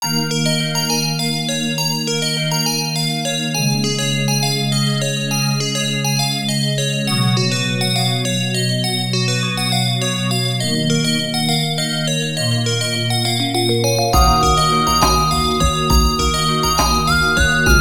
Alarm; Timeless Alarm; Sony Ericsson; PlusFourSix; Ying (F305)

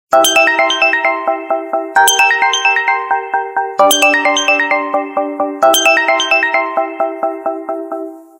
Pure tone; Samsung; GT-i8700 Omnia 7

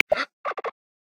Capybara, Amazon; Xiaomi Redmi-Note-8-Pro

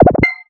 flap, notifications; Nothing Phone (2)

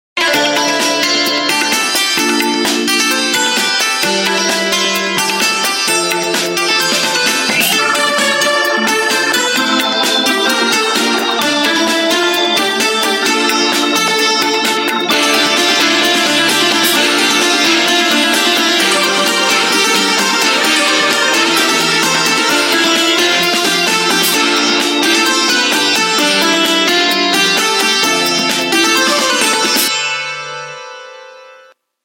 Ringtone, Samsung GT-B3313 Corby Mate

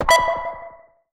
Scry, notifications; HTC One M9

